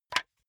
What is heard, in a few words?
wood board small drop; objekte; holz